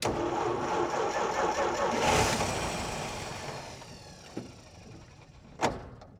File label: Plymouth Failed Start, Period Vehicles; Sony Pictures Sound Effects Series Volumes 1-10; Sony Creative Software